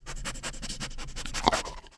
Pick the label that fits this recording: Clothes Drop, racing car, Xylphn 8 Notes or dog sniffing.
dog sniffing